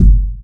hot steel kick drum sound g key, Royality free kick single shot tuned to the G note.; kick drum samples